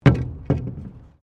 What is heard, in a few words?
Wood On Wood; Material components for constructing more complex sounds; Wood (Sound Effects:Materials); SoundEffects-Foundation4